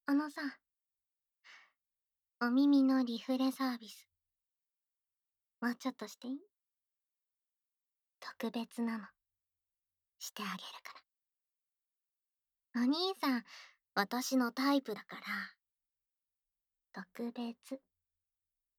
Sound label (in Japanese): 耳キス 耳舐め 手コキ, 効果音なし; この可愛いお耳、思う存分舐め舐めしてあげる♪; 手コキで大量放出